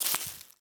Foot Weeds Shoes Walk

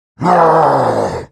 Mob attack

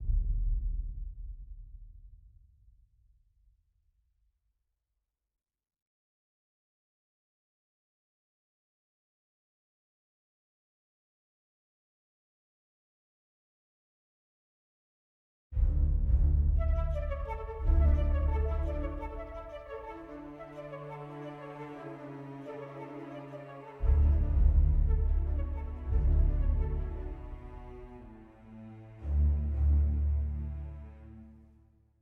World Music

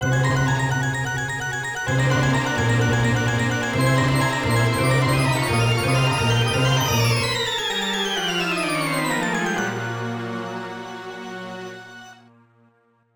Miss; Prop Cycle; arcade soundtrack

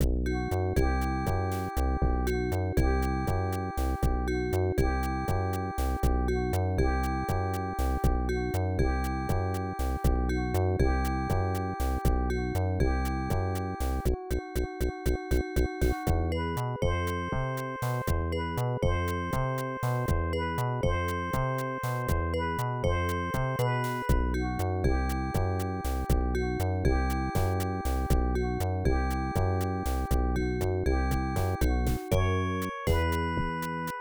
Rumba, AdLib; Blake Stone Aliens of Gold; MS-DOS

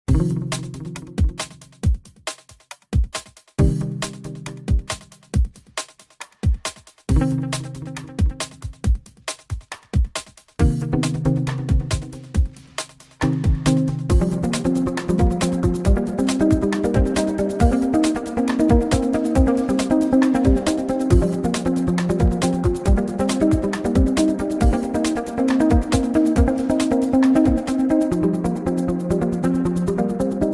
Just Bass (Waiting)

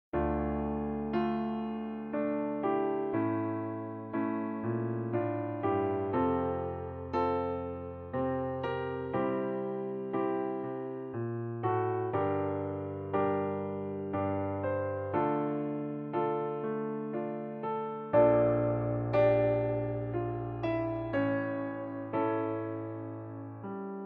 Sarabande, piano; Piano app by Yokee

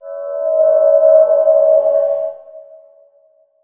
Holy Shimmer; Hades

